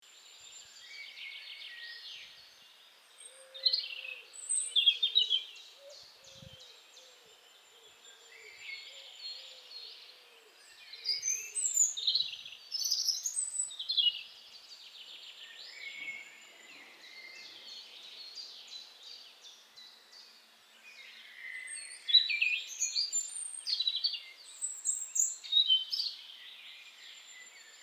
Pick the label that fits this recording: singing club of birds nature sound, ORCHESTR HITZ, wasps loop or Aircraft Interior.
singing club of birds nature sound